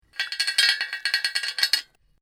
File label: Metal Chain Pulley Rattling Chains; Part of the LYN104 package of Chain sounds; Metal Sound Effects